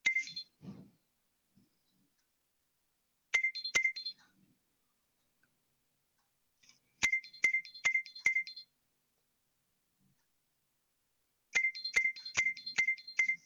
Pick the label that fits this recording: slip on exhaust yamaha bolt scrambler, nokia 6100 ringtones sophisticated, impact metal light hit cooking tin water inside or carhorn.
nokia 6100 ringtones sophisticated